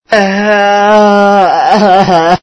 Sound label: ahhahahah